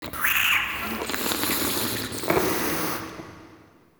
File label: Interior Gurgle Slurps; Ambience; Indoor; Warframe; Infested Eris